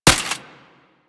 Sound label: Primary Initial Mech, Weapons; Sapient